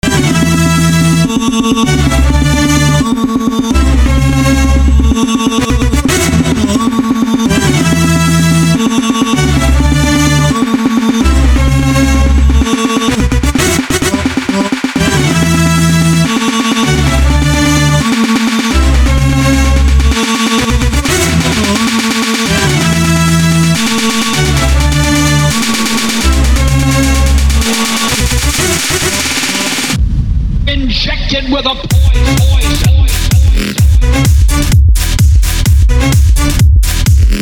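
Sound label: Turkish motifs Electro Remix; Tureckie motivy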